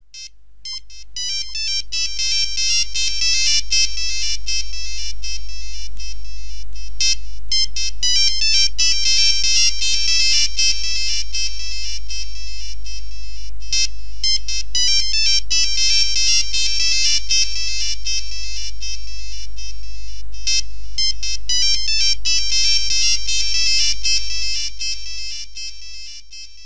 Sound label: Nokia Old With Echo